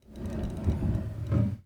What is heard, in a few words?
Drawer Close In Bathroom; household